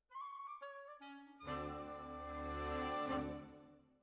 Violas Over

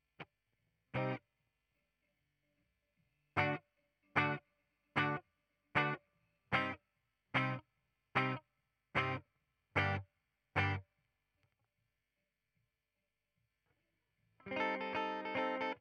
Gtr, Ragtime

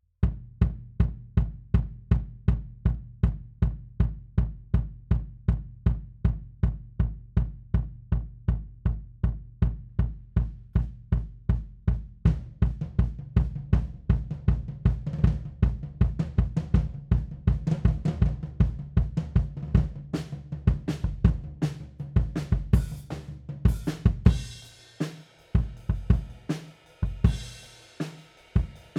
Kick Out, 160 BPM

